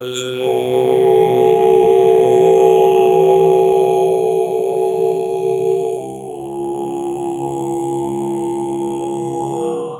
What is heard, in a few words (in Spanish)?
culto tribal cantando en, tribal